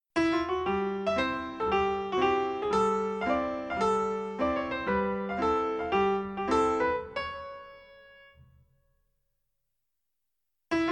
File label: piano lesson